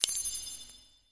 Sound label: menu click; Warcraft 3